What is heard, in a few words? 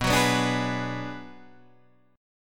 Gsharp Minor 7th Flat 5th